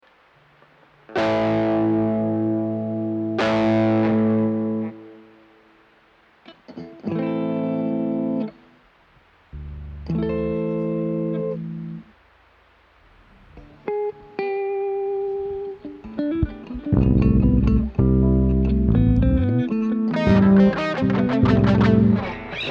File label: Strings